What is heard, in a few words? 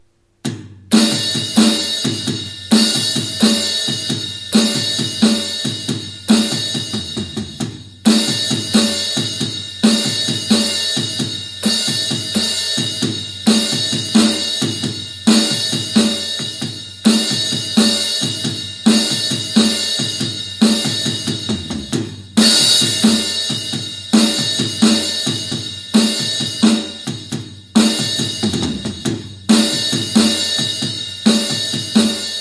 drums in need of serious practice